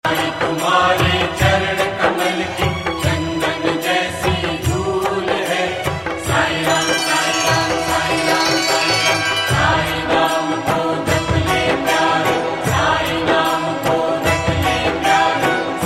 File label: ringtone